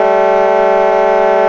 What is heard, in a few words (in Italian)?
Clackson, motori